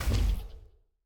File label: Teleportation